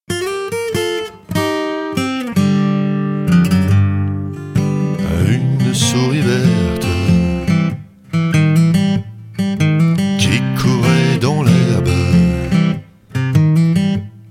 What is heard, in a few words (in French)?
Blues